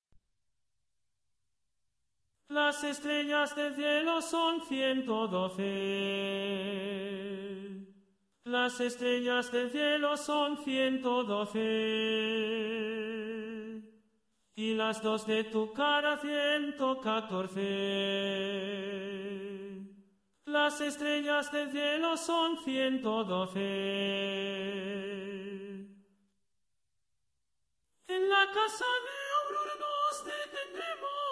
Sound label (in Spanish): 4 voces; parranda